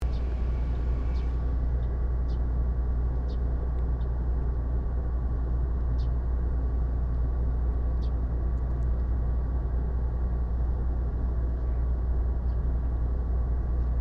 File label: Aratsu industrial complex